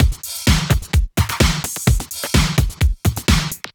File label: Housebeats 128BPM; Vengeance Samples